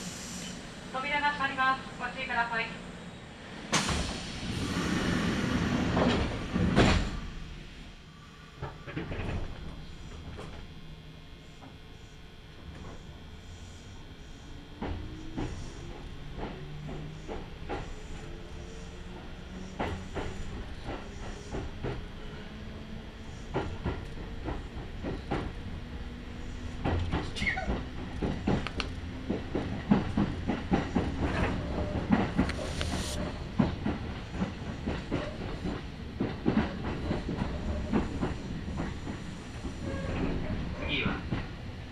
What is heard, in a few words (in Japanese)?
近鉄名古屋線 走行音 普通列車; 津新町行き